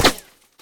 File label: stone, hit; tacz-tweak-pack; taczmechanics